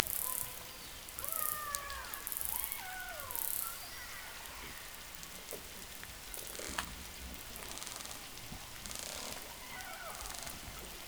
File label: hydrophones, lime kiln